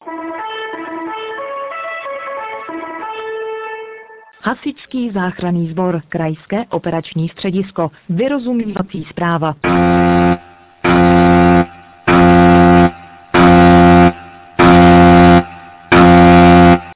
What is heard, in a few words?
SMS